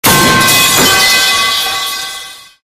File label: metal pipe